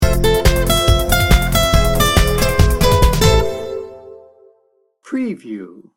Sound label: fashion pulse sting bumper